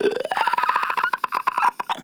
Infested Runner Patrol; Warframe; Runners

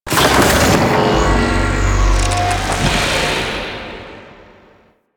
Corpus Gas City Reactor Deactivate, Ambience; Warframe; Gameplay Remaster

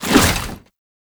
Infested Hammer Idle Whoosh, Weapons

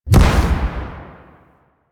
Grenade Explosion; Warframe; Quests; The New War; Kahl; Abilities

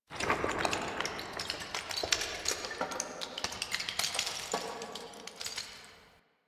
Dungeon Island Cave Crumble; Ambience; Duviri; Warframe

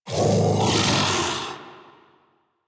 Armored Vocal Alerted; Enemies; Warframe; Kubrow

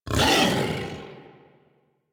Feral Vocal Angry Attack Charge; Enemies; Kubrow; Warframe

